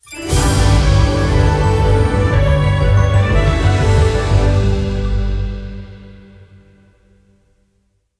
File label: intro bonus game; fairyred